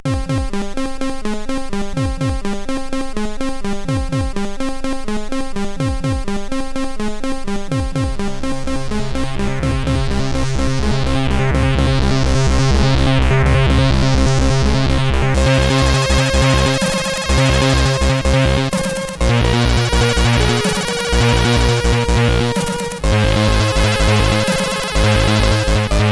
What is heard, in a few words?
Commercial Break sid CSG8580R5